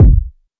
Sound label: reverb bass drum single hit g key